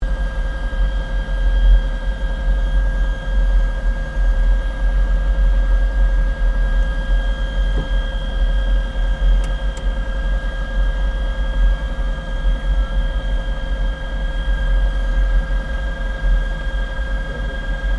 AIRCRAFT GLOSTER METEOR F MK8 IDLE INTERIOR, Gloster Meteor fighter jet; Airplanes; Vehicles